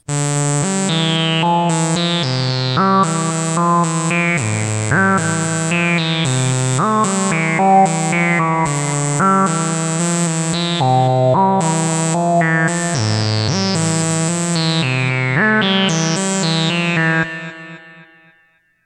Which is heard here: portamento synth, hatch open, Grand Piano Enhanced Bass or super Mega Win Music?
portamento synth